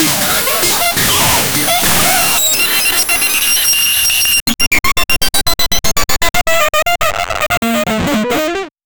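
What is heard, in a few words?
bricks creating sound, broken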